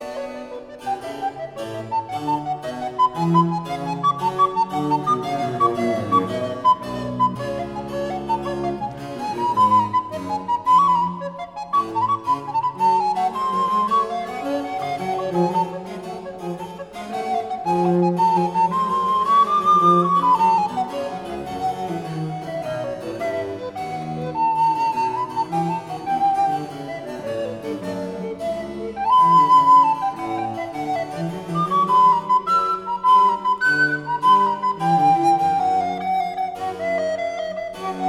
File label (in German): sonatina c moll fuer altblockfloete und basso continuo allegro